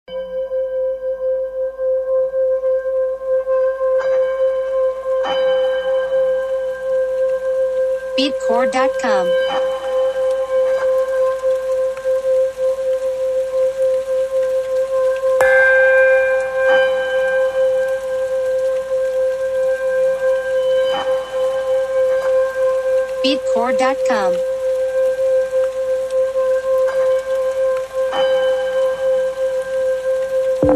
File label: tibetian singing bowl 528hz